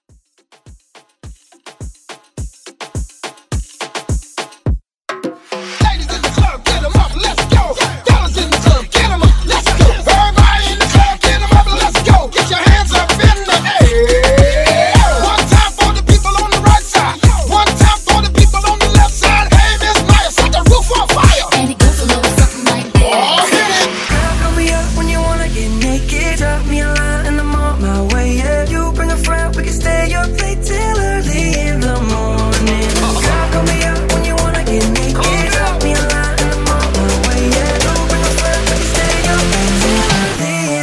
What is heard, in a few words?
Moombah Redrum Hype